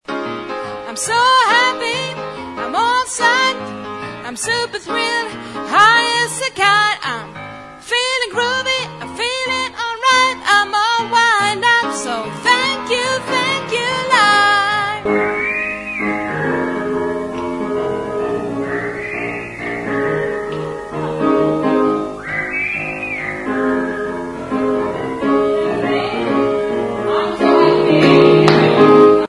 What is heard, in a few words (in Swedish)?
sopran